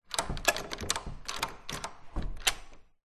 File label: household front door handle turns hallway reverberant; zapsplat